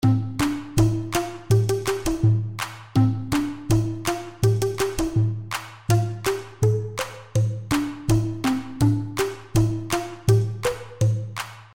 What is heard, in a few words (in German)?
Boomwhackers klaenge